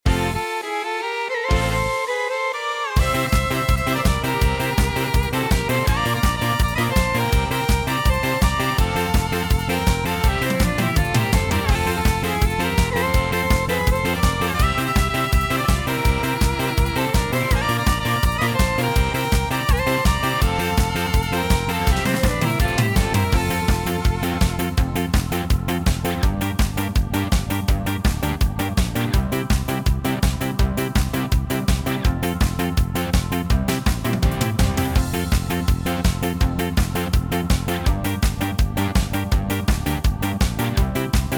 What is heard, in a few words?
Midi Yamaha